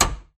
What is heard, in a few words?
angle Stabilizer Click; projectile data lab